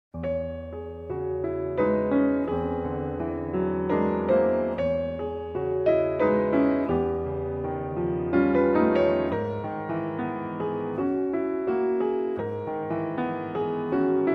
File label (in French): Impromptu pour piano